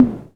Rythm-55; Best Service Gigapack 2

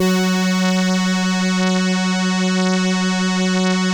ELKA, String Machines; ELKA RHAP

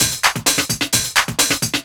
Deep House 130bpm

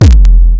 Roland Sh-101; Drums

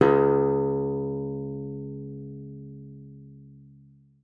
C#2, guitar; Xsample VOL-2

